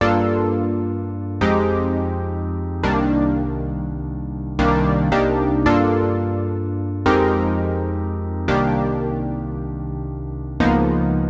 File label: 85 melodic stack keys Cmin; newcar